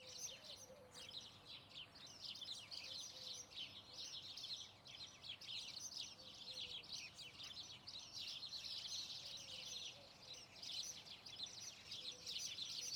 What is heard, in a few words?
Rural background, birdsong, cuckoos, loop; Sound Ex Machina; Animal Farm